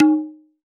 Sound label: Tabla Baya Tun; Roland BK-5; Percussion